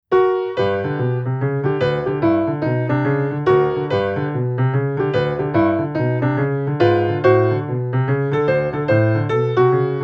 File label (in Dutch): Rag, Piano oefeningen; Johnson Rag